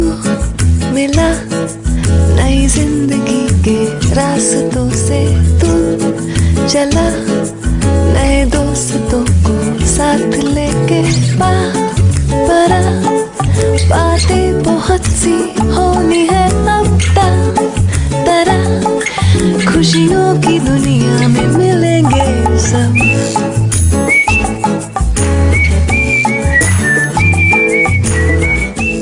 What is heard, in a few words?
cool sms tune